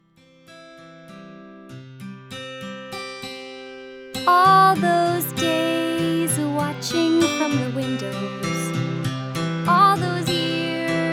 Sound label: Singing